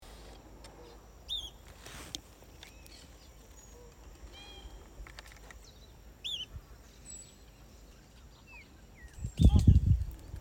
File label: northern scrub flycatcher